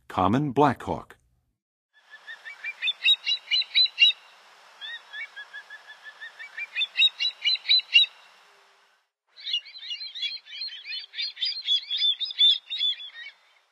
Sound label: Common Black-Hawk, Birds of North America; Western USA; Western USA Songbirds